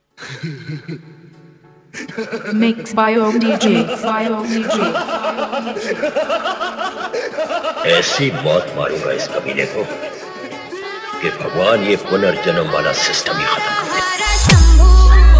Remix; Dailuoge Vibration Remix

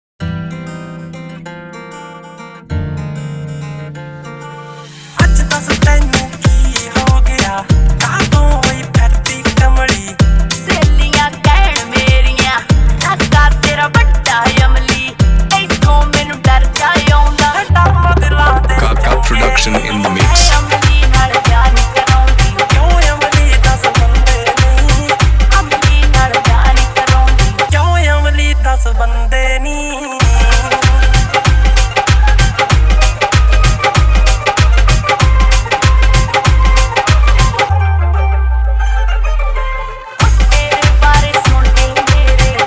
Dhol Remix

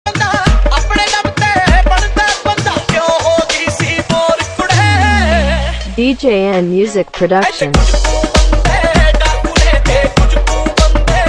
Dhol Remix

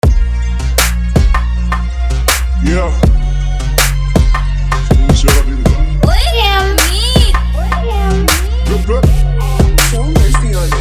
Remix Punjabi Song

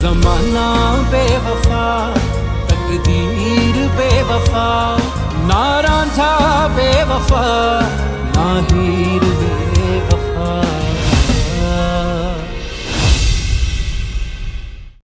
New Punjabi Song

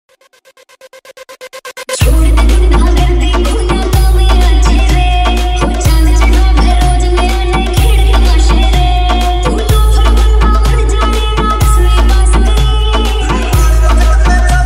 Dj Remix High Bass Vibration Remix

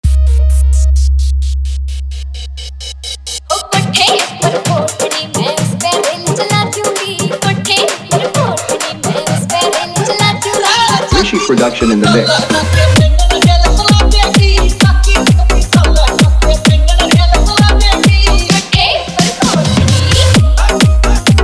Hard Bass Dj Remix

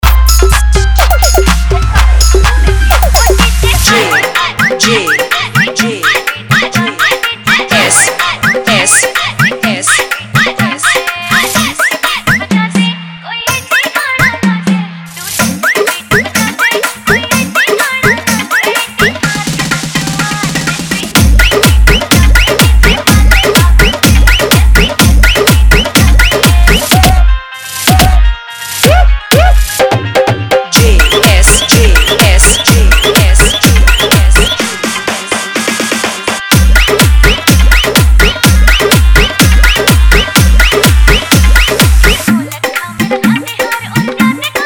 Edm Remix Song

